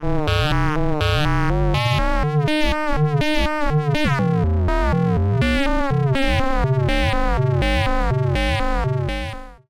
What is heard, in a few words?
Erica Synths Pico System 3 FM